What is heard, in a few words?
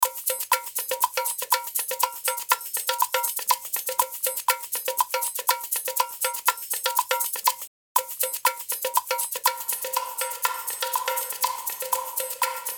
Reverb Percussion; 112dB Redline Reverb